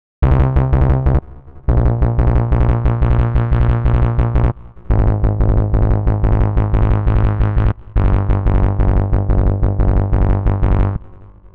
gate 16 steps trigger; the meditation soundset

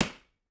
digital dribble bal; nova sound

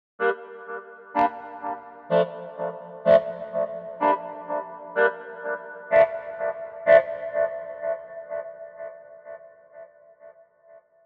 melodica chords; etheral melodica; soundfingers

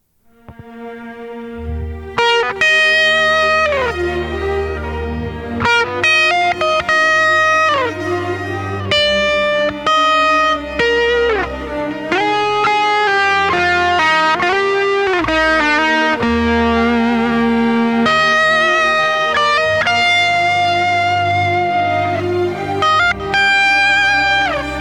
classical

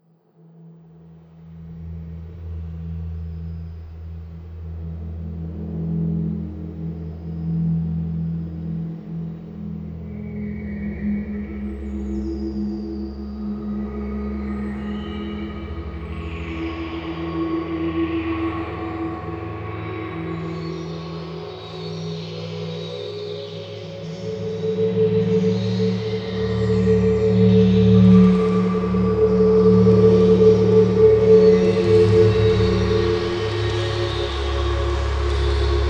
atmospheres; organic instruments

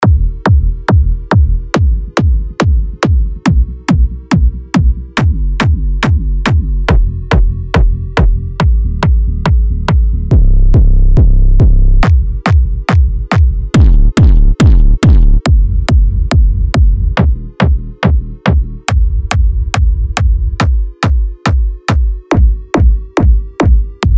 techno kicks; revealed techno kicks vol 8; revealed recordings

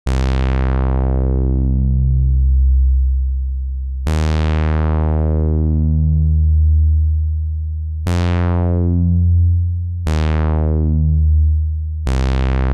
ms20 lp filter; synthmaster

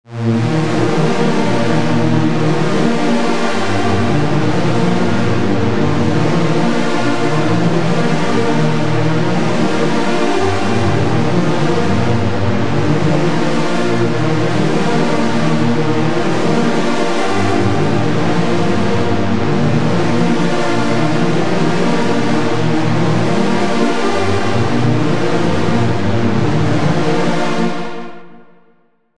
midnight pulse; cirrus

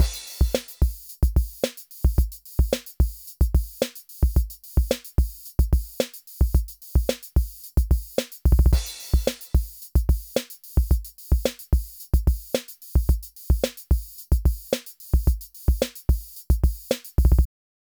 Drum Machine Dry

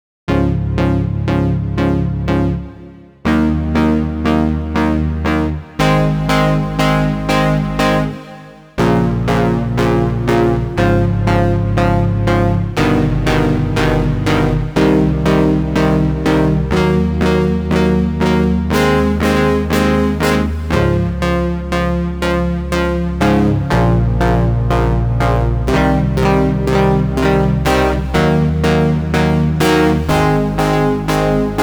dry plucked seq; the digital life

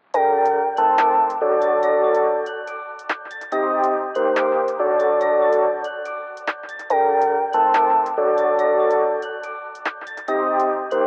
808 box; 808 box 4 by iceberg audio

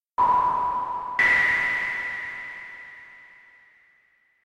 pwoosh

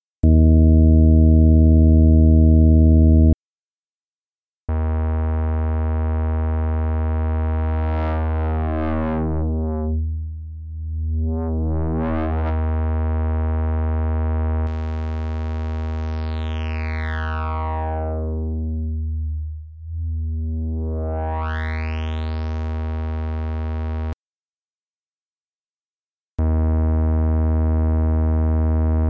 some filters

